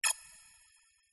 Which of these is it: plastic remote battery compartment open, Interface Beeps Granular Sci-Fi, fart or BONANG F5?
Interface Beeps Granular Sci-Fi